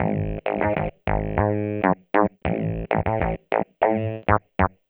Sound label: Funk Riff; Sonic Foundry (Sony Creative Software); Synchro Funk